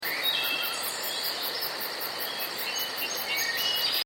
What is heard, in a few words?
Ambiences